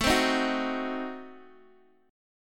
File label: A Diminished 7th A down Guitar DADGAD